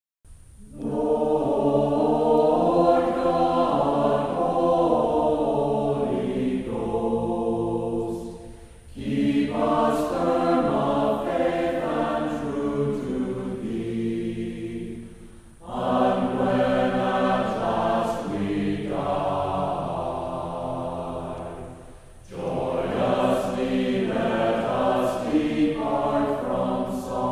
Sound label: Choir; Kyrie, Lord God, Holy Ghost